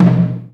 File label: LARGE DRUM, JAPANESE PERCUSSION; AKAI S6000 CD-ROM - Volume 5; Ethic